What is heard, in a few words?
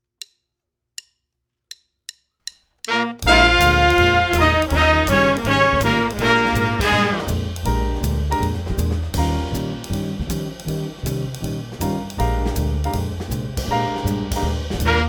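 12 bar blues lick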